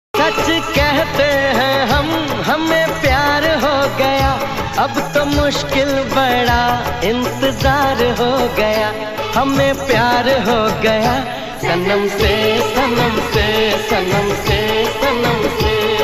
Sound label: Old Song